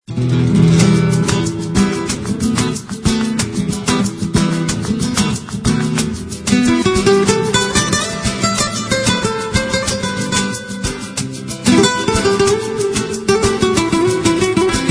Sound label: rumba